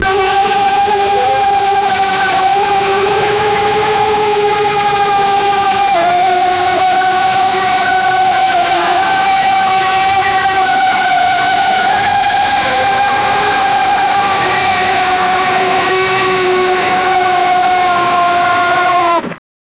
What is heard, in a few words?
monsters are running and, destruction